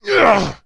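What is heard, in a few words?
moan, male; Interactions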